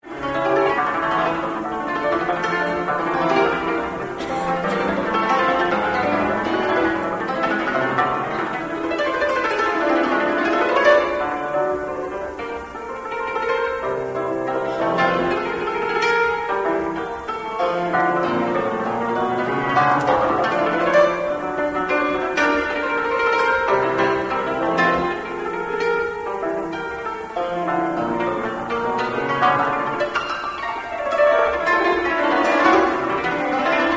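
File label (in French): variations sur a moll czardas, cymbalistes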